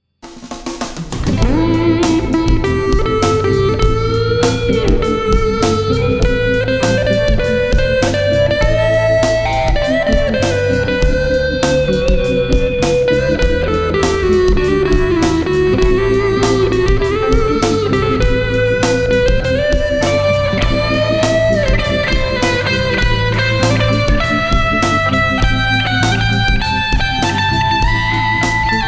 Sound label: lead, The first half features the "woman" tone, then I switch over to the bridge pickup to finish the solo; lead break